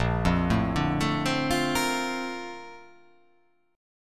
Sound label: Bb Major 9th, Bb, down; arpeggiated; JGuitar